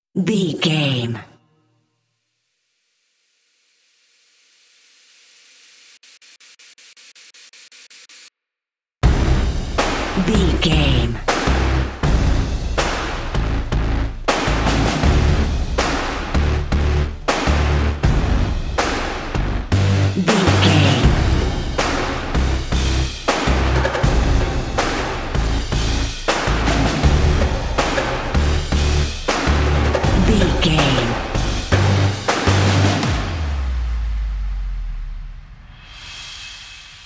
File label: drums and bass